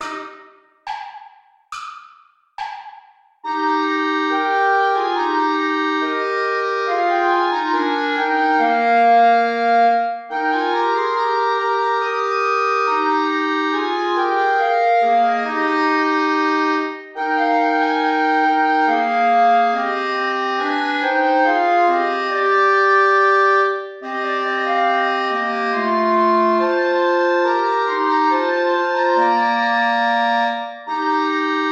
play along without clarinet; haendel georg friedrich; tochter zion freue dich

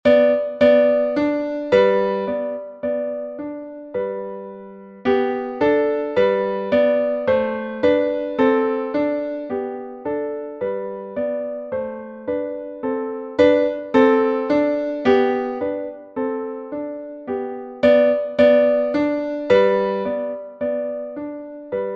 echo dance; the final dance; der Kehraus

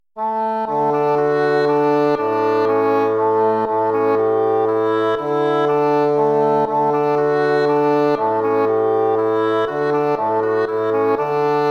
waltz; Carulli Ferdinando

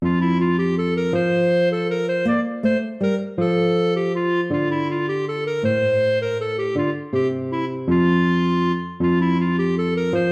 lute

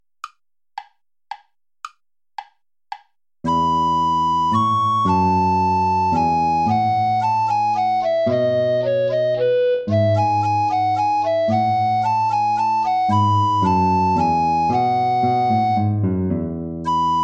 minuet major play along without clarinet

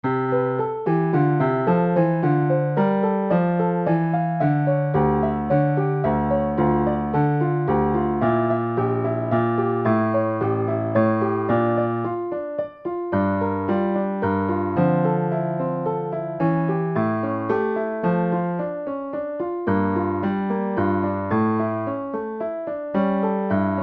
piano; colora

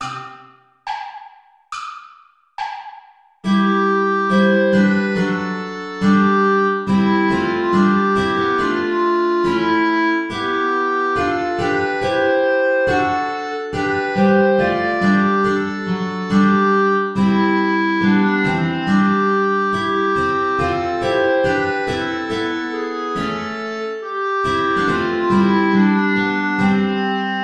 play along without guitar

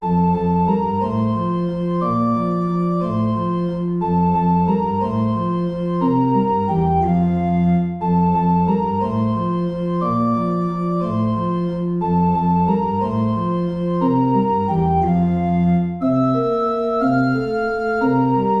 waltz; Carulli Ferdinando

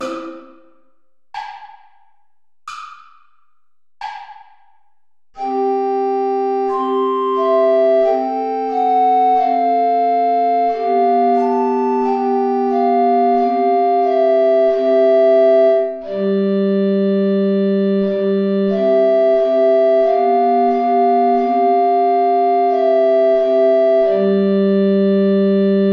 play along without alto recorder

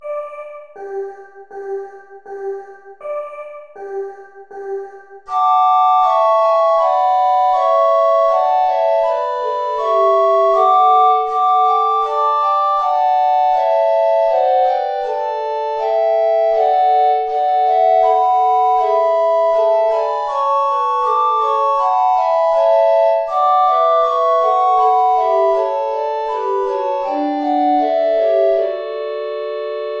play along without bass recorder; bach johann sebastian; christ est chantons choeur

